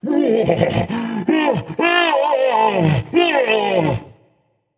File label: nv fear; smite; voicepack; ganesha